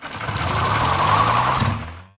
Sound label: Whirling Zapomatic Play Underlay